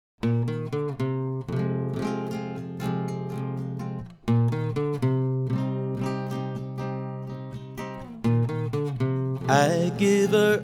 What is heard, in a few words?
acoustic